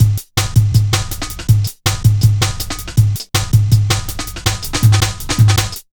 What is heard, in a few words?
Drum Loops, Jungle